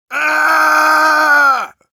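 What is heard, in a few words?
Engineer pain critical death, ru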